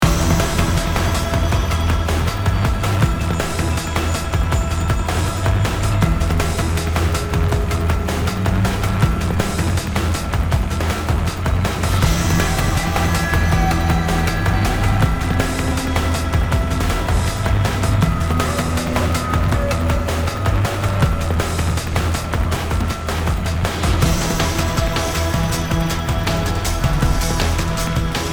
Exterior Music Mix; Sin Omega

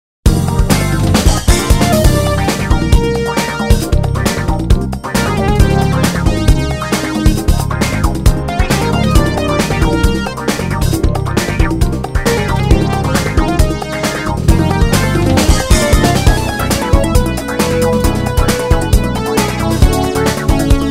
funk fair; Daytona USA Circuit Edition

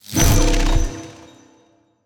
Victory Magic; Dumbledore

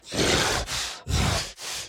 Enraged Breathing Loop; The Town

